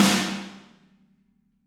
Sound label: Snare Hard